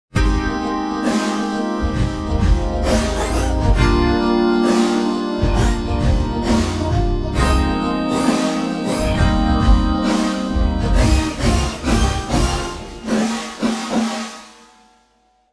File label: Pop; TSMtoolbox